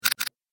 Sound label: Robot Blinking; Robot Sound Effects; Sci-Fi Robot Sfx; Andrew Fleming